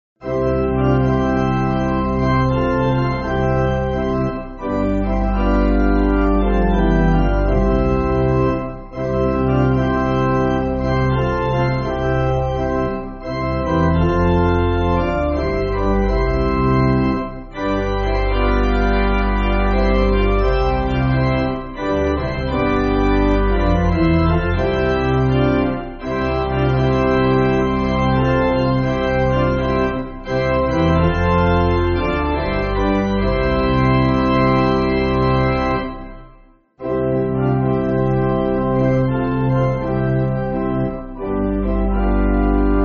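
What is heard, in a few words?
Pipe Organ, Backing Files for Churches; SS Union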